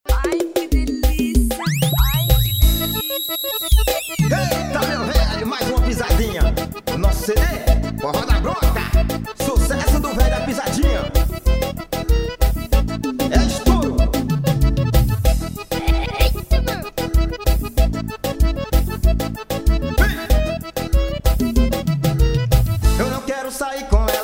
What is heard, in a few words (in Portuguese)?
forró